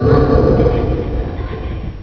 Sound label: attack; AoMClassic; monsters; lurker